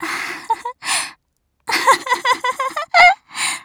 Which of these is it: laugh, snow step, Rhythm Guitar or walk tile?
laugh